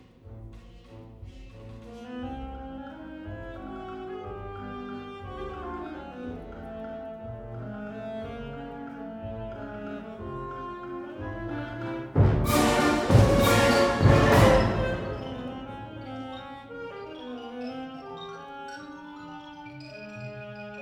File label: Altsax solo; Saxofoon en orkest